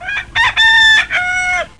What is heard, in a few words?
rooster